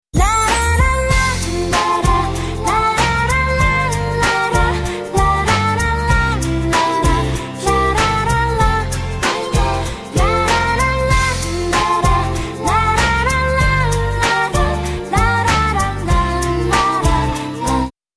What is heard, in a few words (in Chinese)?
oppo广告音乐